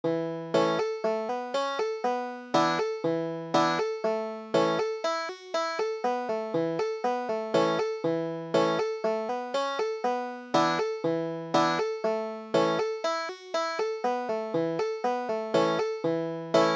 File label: banjo